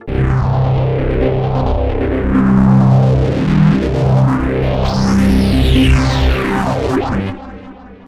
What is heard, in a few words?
NRG Bass, Trance